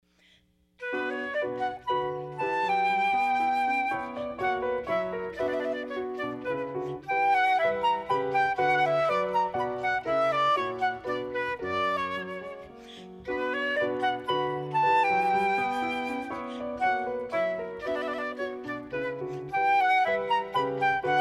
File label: Flute Piano